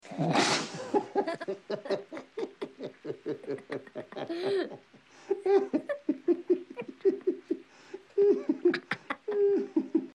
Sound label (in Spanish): risa